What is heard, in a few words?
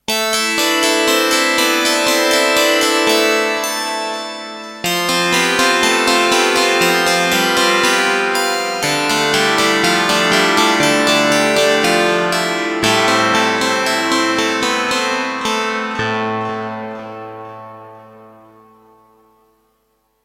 TX7 Harp; NeHarpi